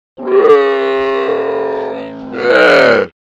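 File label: sound of camel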